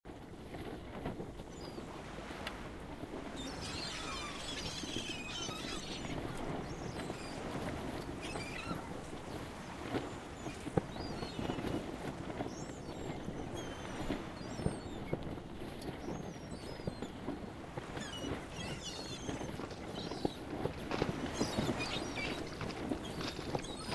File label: Spring; Blind Quest - The Frost Demon